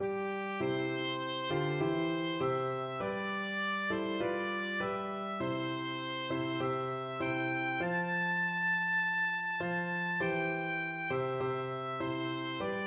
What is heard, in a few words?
auld lang syne, oboe